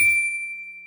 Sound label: bell d7